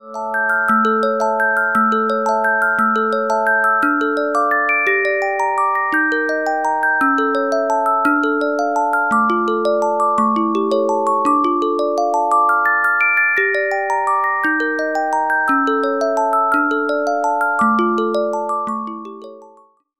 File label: Carillon Music Box Melodies